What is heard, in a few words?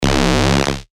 Bass Shot C#, synth shots tech house; Thug; revealed